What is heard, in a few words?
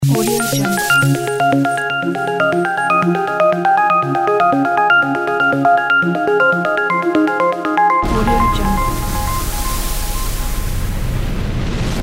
scifi logo